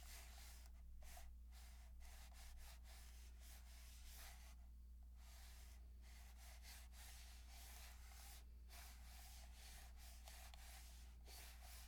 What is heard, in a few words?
Computer Mouse Moving On Pad